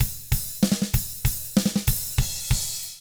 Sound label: HARD ROCK, Drums; 96HARD ROCK